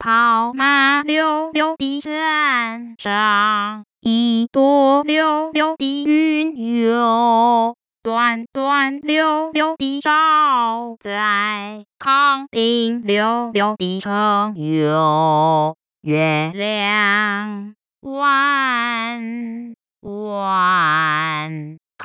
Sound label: madrigal, vibrato; KangDing